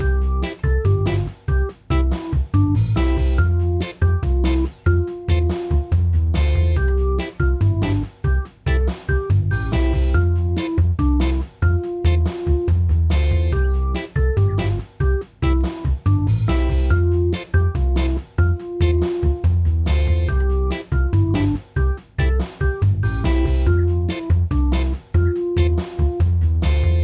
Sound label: elevator intermission